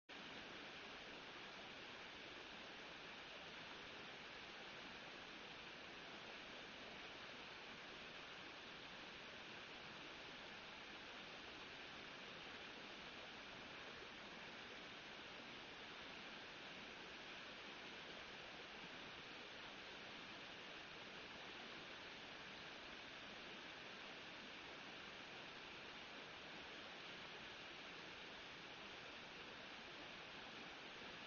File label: riverside